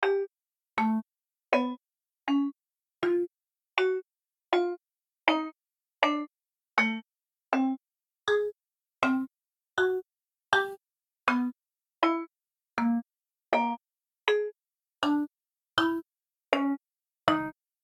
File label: stringy Perc, chimeras